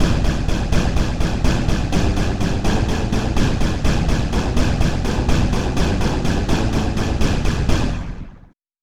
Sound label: orchestra hit bass